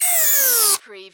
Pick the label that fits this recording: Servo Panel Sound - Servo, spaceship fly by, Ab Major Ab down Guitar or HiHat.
Servo Panel Sound - Servo